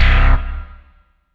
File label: CLAV; SFX